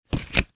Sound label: game card place down on playing board; monopoly